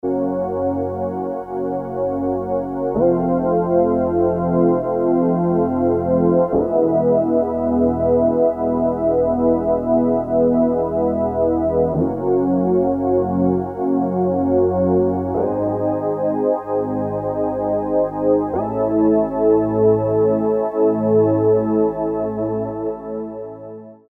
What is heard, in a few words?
Voices, Korg DSS-1 DWGS synthesizer presets; Korg DSS-1 Sound Library